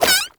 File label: Shrink Baby Jump; Warframe; Nokko